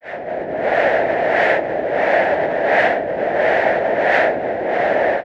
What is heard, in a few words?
synth Lemniscate